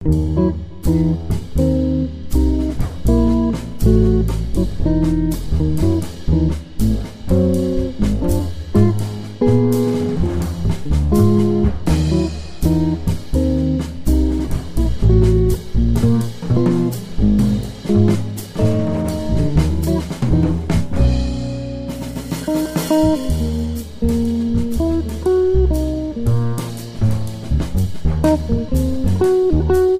Blues in Eb; Art of the Blues

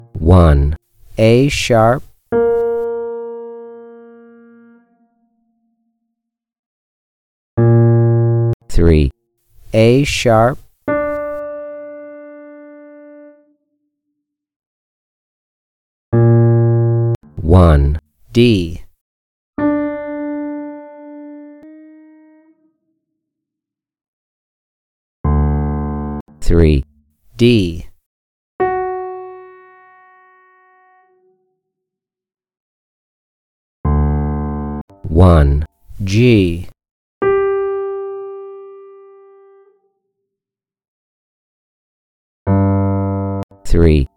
Rhythm Changes in Bb; designated degree ear training; key to your kingdom

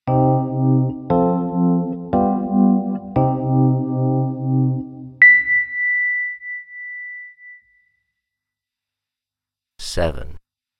7th Rhoads Mark 1 Minor; ear training instrumental color

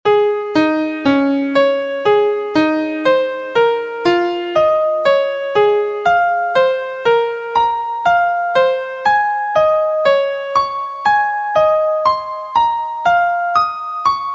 Atomic Scale Key Db; harmonic and melodic equivalence

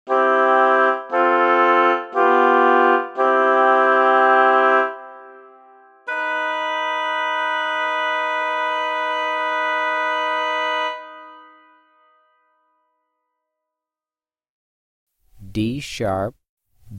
D#3 B3 Oboe 2 Note Stereo; Level One “Stereo” Example; ear training two note

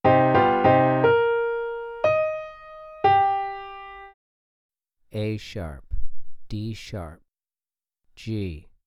Piano Min a#3 d#4 g3; ear training three note melodic

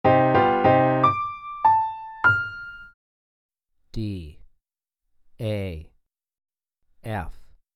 Piano Min d5 a4 f5; ear training three note melodic

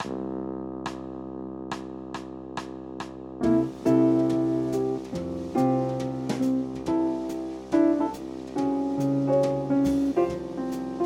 C Major Blues Piano and Drone Soprano; Applied Jazz Ear Training; Practice Perfect; 12 Bar Blues Ear Training in Real Time

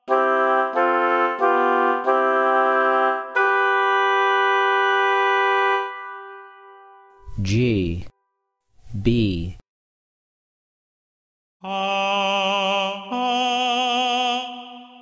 G3 B3 Oboe 2 Note Singing; ear training two note; Level Two “Singing” Example

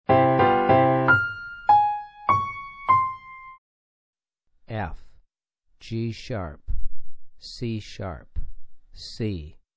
4 Note Mel Piano Maj f5 g#4 c#5 c5; ear training four note melodic

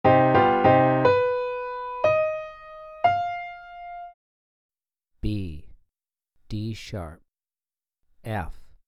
3 Note Mel Piano Min b3 d#4 f4; ear training three note melodic

